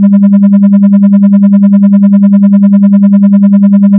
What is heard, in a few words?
am, AM Modulation